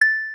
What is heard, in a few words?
ting